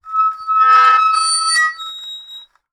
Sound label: Soldering Iron Stand Drag